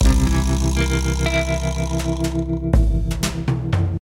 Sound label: Quest End Gag; Quest Stinger AAC2Q5; club penguin island; android